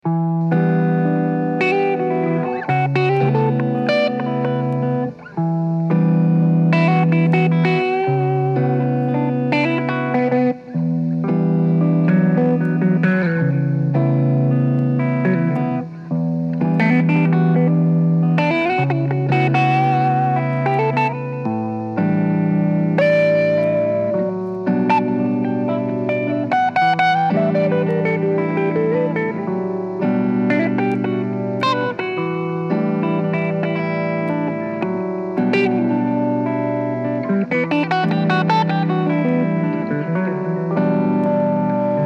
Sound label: Five Four Vinyl Blues